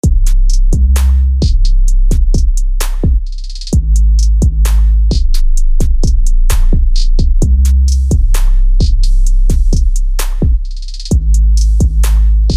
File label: Drum Kit